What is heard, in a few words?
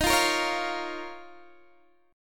D sharp 7th Suspended 2nd, Guitar, Open D